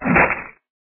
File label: skeleton attack